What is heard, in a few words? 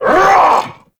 Vocal Attack Short; Warframe; Duviri; Dax